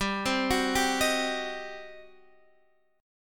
G Major 7th Suspended 4th Sharp 5th G down Guitar DADGAD